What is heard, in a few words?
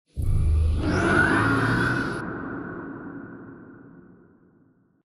Giant Floor Panel Exit On Track Start; Boomtown; Sideways Town; Titanfall 2